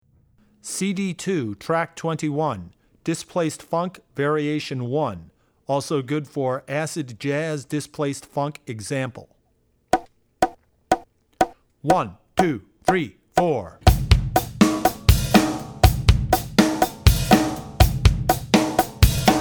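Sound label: Funk - Displaced Funk Var, Drum Tracks; Displaced Funk (Variation 1); Drummer's Bible Tracks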